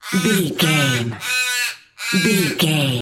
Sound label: bird crow call caw squawk close